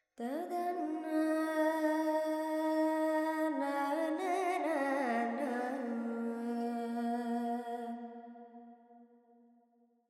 Verb Dorian, Vocals, Carnatic Female, Alaps, 70 G

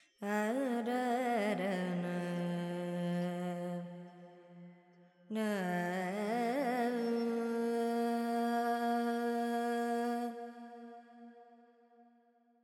FX Dorian, Vocals, Carnatic Female, Alaps, 70 G

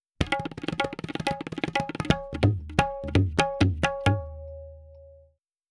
Tabla C 4-4 128, Percussion; Sample Banks

